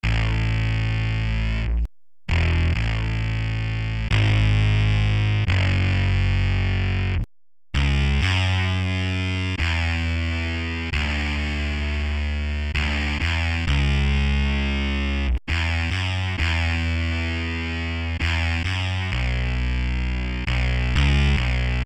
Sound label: fuzz bass overdr; bassesland